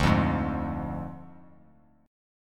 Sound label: D Diminished D, Bass Standard; strummed